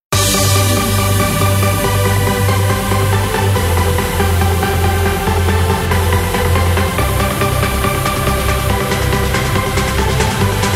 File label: Female Vocals